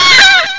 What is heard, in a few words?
CRY